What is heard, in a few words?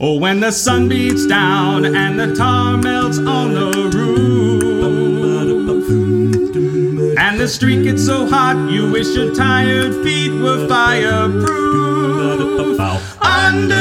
acapella